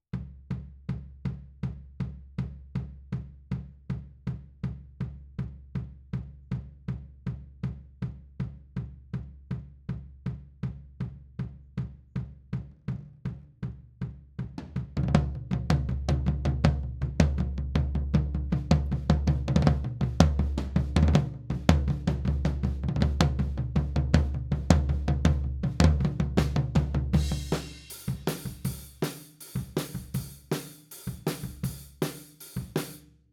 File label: Floor, 160 BPM